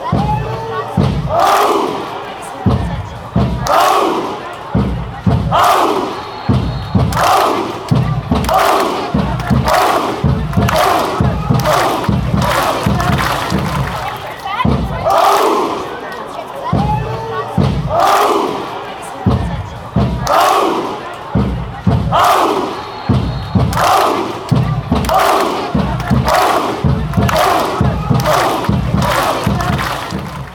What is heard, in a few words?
drum woah chant